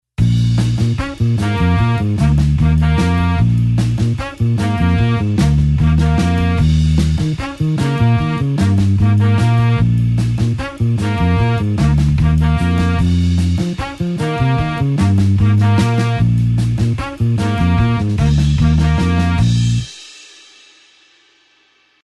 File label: blues horns 8beat Bb tempo